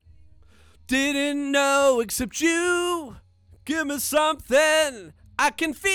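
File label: vocal; Pro Tools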